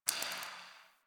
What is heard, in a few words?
Derelict Interior Water Drip